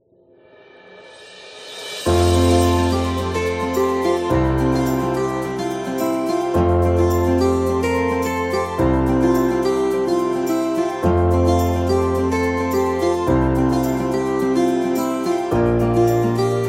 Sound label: Acoustic Guitar